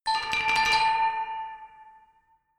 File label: glockenspiel dark tremolo low octave short